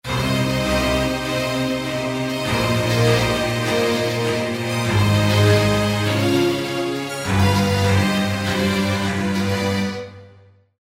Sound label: strings